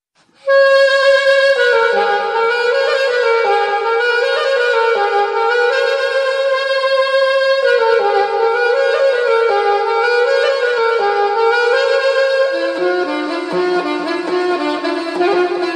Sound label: ekveera aai ringtone